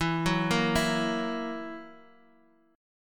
E Suspended 2nd Flat 5th E down Guitar Open D

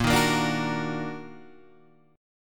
D sharp Minor Major 7th A sharp

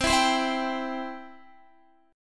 C Major, Guitar; strummed; x,15,16,17,16,15

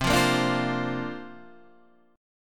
C 7th Suspended 2nd Suspended 4th, Guitar, Open D

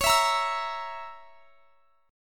C sharp Minor 6th C sharp down; C#m6 strummed

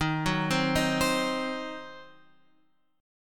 D sharp Minor 6th D sharp; D#m6 Chord {11 9 10 8 X 8} arpeggiated

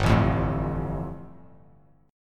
G 7th Suspended 2nd; strummed; JGuitar

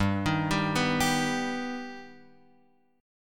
G Augmented G Guitar Open F; G+ arpeggiated; x,2,3,2,2,2; JGuitar

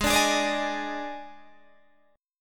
D sharp Major 7th Suspended 4th G sharp

